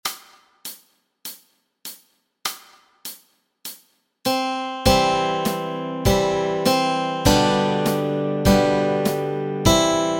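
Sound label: melody and chords, Easy Guitar and Ukulele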